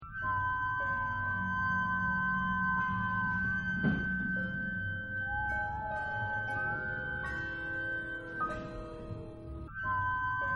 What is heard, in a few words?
cry